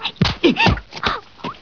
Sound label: Fight; 特酷声音素材库